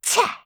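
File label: attack, Voice Line, Korean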